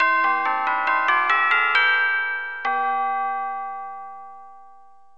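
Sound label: tubular bell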